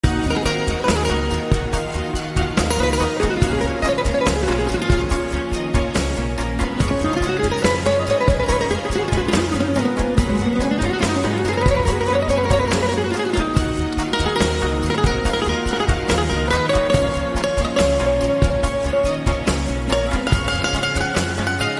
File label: sad mobile ringtone